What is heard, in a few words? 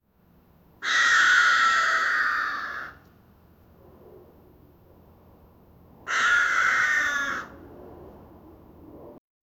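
crow crowing in the distance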